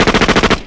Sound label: blitz beat; hunter